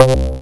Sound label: Lead, 8-Bit